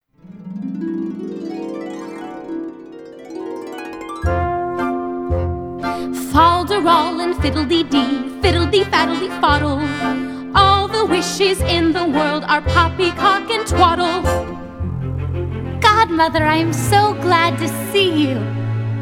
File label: Fol De Rol Guided Vocals; Cinderella; Rehearsal Tracks